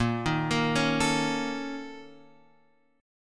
A sharp Minor Major 7th Double Flat 5th A sharp Guitar Open F; A#mM7bb5 arpeggiated; JGuitar